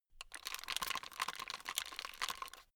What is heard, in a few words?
plastic rattle fast